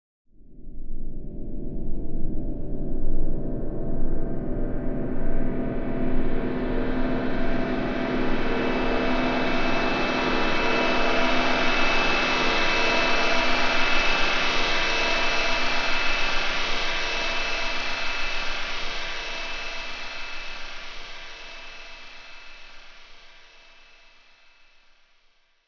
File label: Wash Elongated Elect